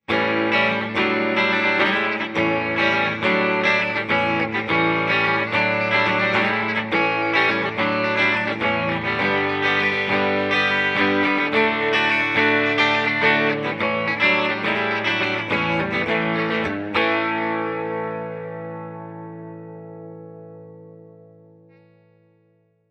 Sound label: Upbeat Vamp, guitars